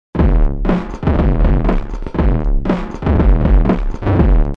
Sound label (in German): Effekt Distortion Lo Fi Bad Transmission